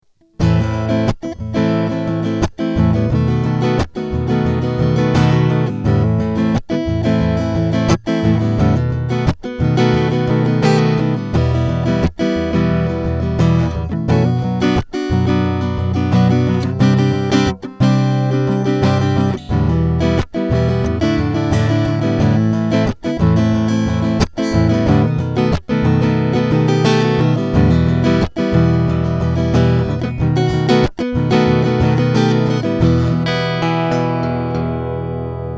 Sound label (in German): Ibanez TCM50 EQ Mitte Strumming